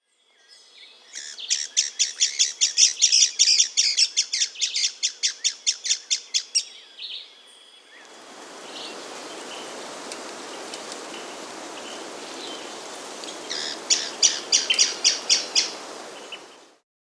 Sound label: Sclater's Tyrannulet (Phyllomyias Sclateri), Andean Birds; S. America